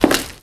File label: Concrete footstep